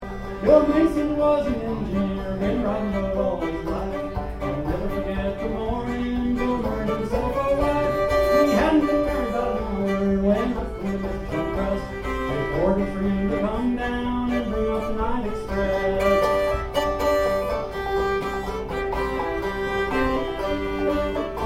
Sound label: fiddle, guitar and vocal; At concert venue Blue in Portland